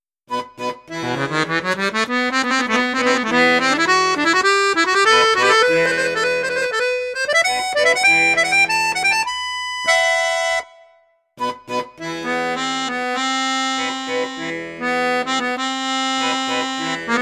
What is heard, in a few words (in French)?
registre basson, accordeon; perle d'or